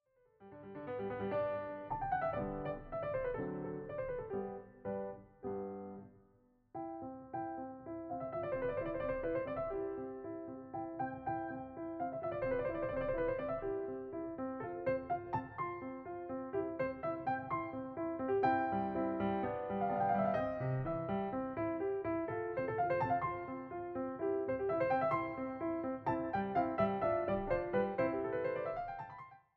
Piano Sonata in C Major, Allegro moderato; mozart; piano sonatas no 9-11

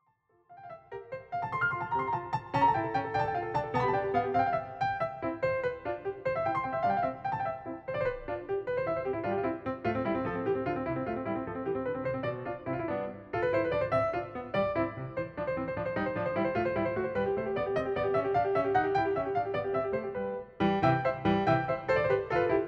Keyboard Sonata in C Major Finale Presto, piano; No. 36; Hob. XVI, 21; haydn

